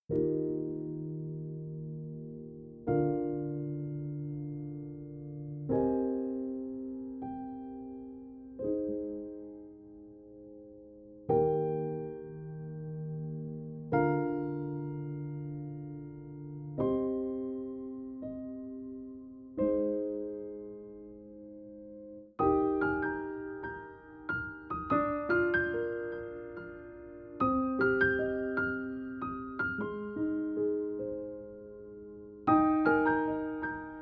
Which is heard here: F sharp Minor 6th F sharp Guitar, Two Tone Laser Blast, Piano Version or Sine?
Piano Version